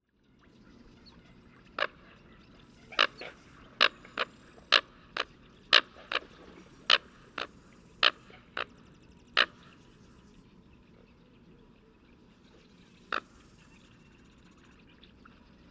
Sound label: American Coot Fulica americana; waterfowl